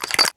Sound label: m4a1 silencer screw on start; weapons; Counter Strike Model Pack; CS:GO